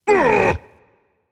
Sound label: surprised rage; chaac